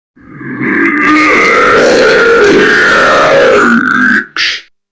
audio horror per scherzi suoni horror sounds prank